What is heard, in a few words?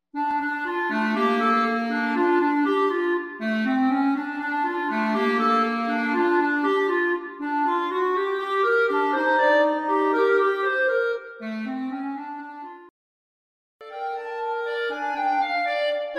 Last Chance Blues